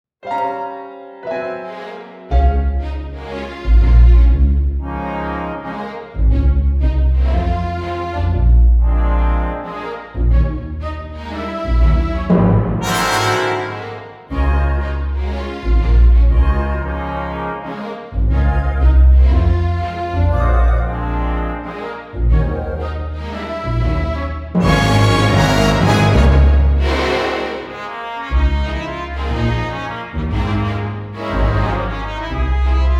Orchestral miniature

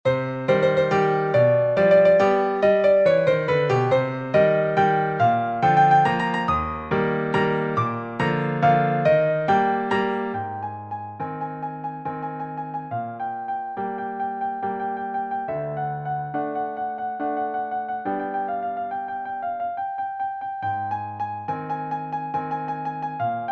waltz

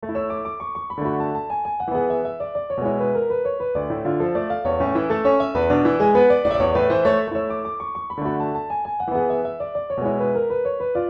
Waltz; Breeze

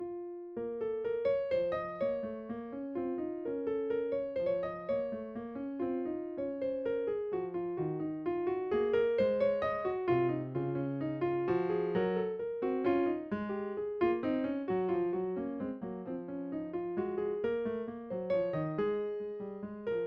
Two Part Invention

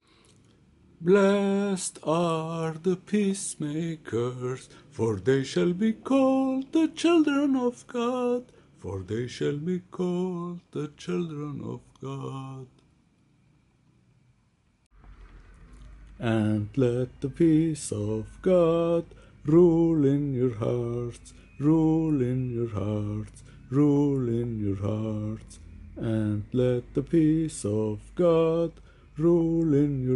Sententiae for Baritone Solo, A Prayer for Peace; Three Sententiae for Baritone Solo, Op. 389, 'A Prayer for Peace' (Based on Three Biblical Verses); Choral, Vocal

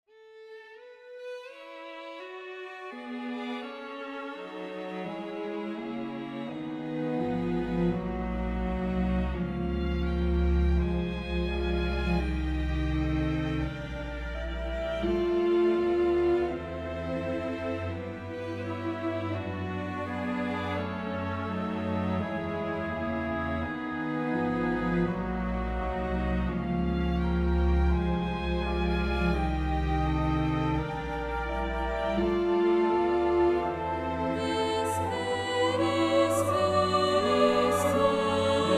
Enharmonic Perpetual Canon; no 2 for choir and orchestra

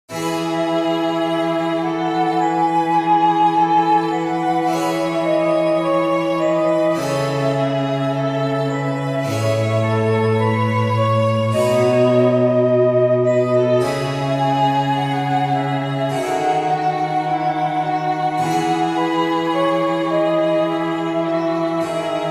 Lento for Trio in E major

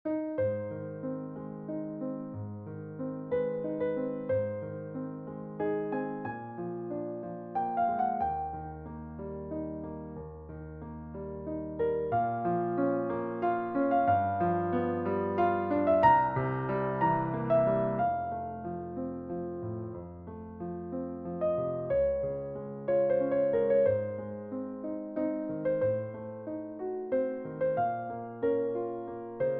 Impromptu in A Flat Major

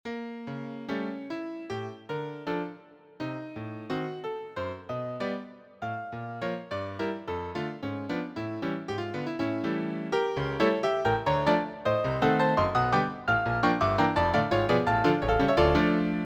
Russian Dance

